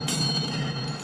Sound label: baseball iling sound